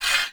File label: Cargo Train Exit Bolt Slide; Warframe; Corpus; Railjack